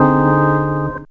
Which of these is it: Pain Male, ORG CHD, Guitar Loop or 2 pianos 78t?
ORG CHD